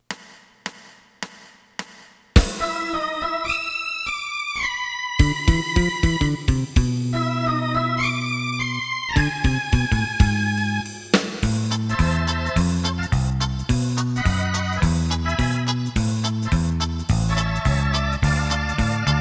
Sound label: lead